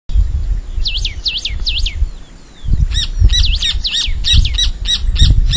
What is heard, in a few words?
Saltatricula multicolor; Perdices; Jorge La Grotteria